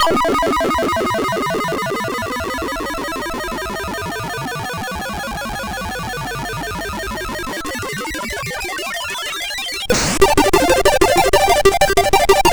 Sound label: Random Waltz, MOS6581R2